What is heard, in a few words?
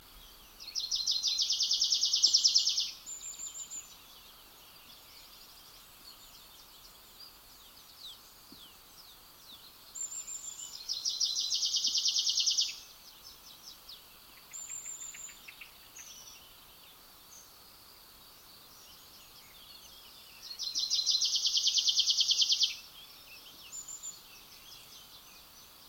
Tree Pipit Anthus trivialis; chant des oiseaux des jardins